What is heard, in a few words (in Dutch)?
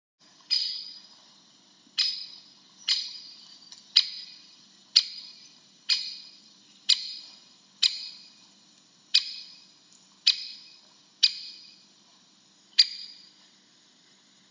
grote bonte specht